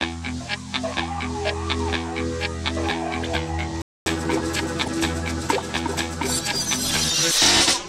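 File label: E minor guitar riff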